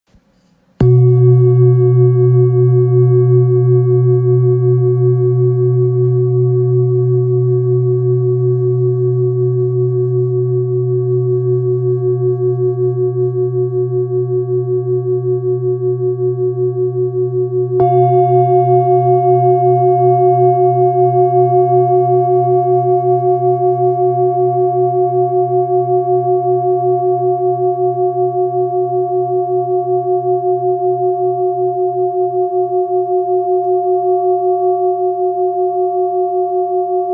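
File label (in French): Bol therapeute la diese, bol chantant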